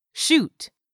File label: shoot